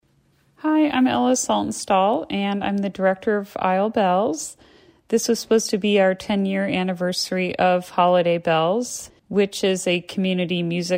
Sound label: Isle Bells Concert, bells; noon newscast tuesday december 15 2020